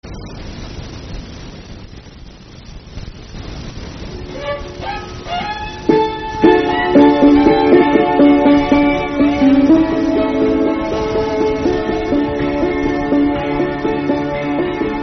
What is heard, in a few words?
Polka